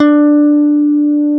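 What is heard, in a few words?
Bass Electric; CMI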